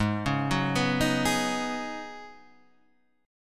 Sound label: C sharp 7th Suspended 2nd Suspended 4th G sharp